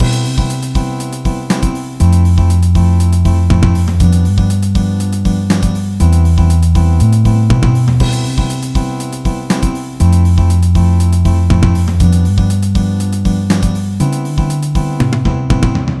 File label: loop